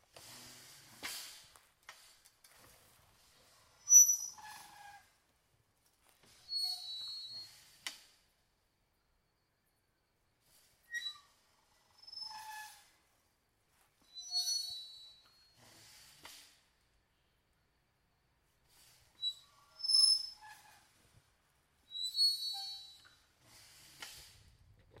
zvuki otkritiya i zakritiya vorot

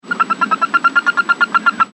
estestvennaya priroda obitaniya zhab

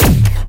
uletayushij vdal lazernij luch

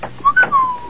nasvistivanie korotkoe

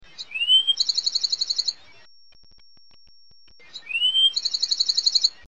bezzabotnoe penie odnogo vyurka

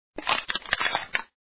razdavili banku gazirovki

